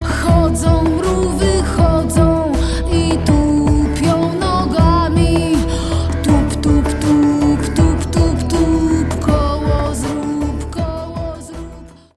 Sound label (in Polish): wokal